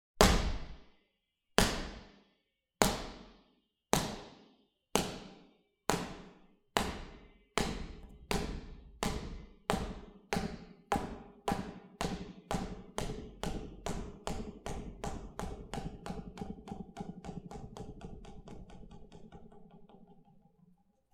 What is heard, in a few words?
Ball Bouncing